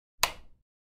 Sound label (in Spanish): clic